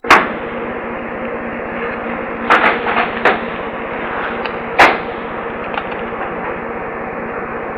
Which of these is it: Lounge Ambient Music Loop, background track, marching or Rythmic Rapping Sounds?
Rythmic Rapping Sounds